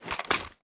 ar inspect, weapons; viper

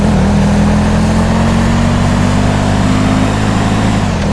third, vehicles; gmod; tdmcars; gtav; pol cruiser

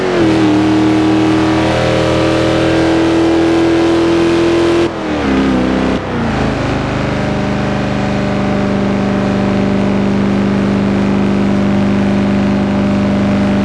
fourth cruise, vehicles; gmod; tdmcars; raptor svt

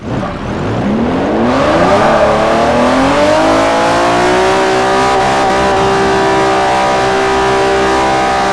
rev, vehicles m1

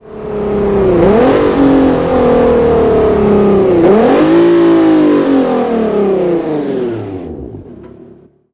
throttle off, vehicles

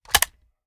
akilo47 selector off, weapons; viper

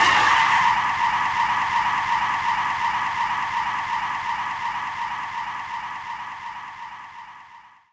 gokart slick skid; vehicles; freeman

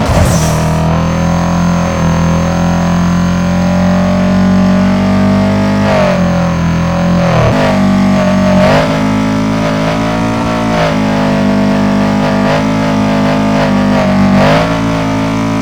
fourth cruise loop, vehicles; golf

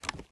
reload fast shake; reloads; gmod

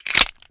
reload fast xmag stock mag in; gmod; pi mike

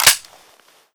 mp5 bolt close foley; weapons; gmod; kryceks swep; navaro mp5

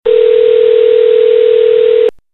phone ring; gmod; perp2.5

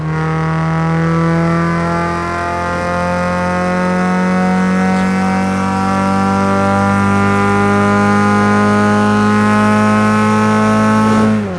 third, vehicles; ceed

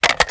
ar reload empty mag in; weapons; gmod; viper

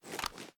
reload drum grab; viper

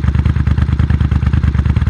gokart idle; freeman; vehicles

